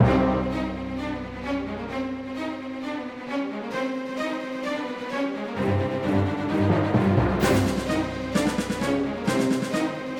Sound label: Russian Fast; Tank Combat